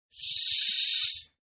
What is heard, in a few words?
Oxygen Tank Squeaky Hiss